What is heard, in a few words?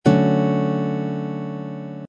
d major seventh chord voicing, guitar